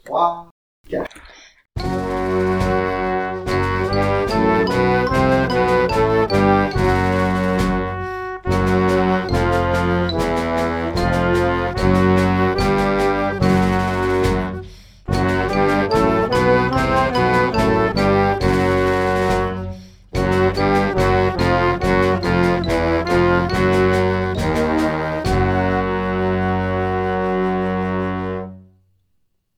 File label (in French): gare au chromatisme noire a 66